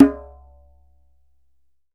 DJEMBE, African Drum; E-MU Producer Series Vol. 7 – Old World Instruments

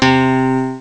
GMC piano; Amiga Soundtracker Sample Packs

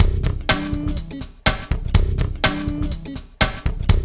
LOOP, Funky Ass Loops; East West

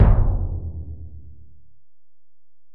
Bell, Alesis DM-PRO

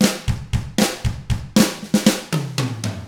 Drums, R N B 6-8; ILIO; Double Platinum Drums 1

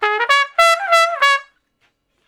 Trumpet Straight (Db), Horncraft for R&B Solos; Crimson Blue and Fabulous Horncraft 4 RnB

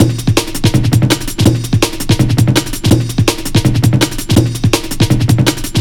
165, drum loops, drum & bass; Total Drum&Bass

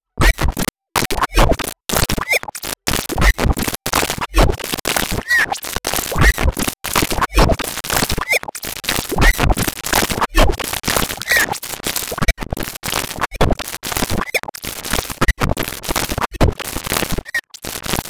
glitch

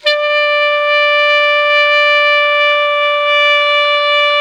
ALTO PP D#4, SAX; Greytsounds - Philip Wolfe David Crozier's Saxophones

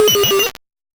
Noise A, 8-bit, Bitty Noises

